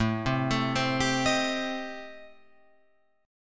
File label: A-Minor 7th Flat 5th, Guitar Open F, chord; Am7b5; arpeggiated; 9,7,9,7,10,10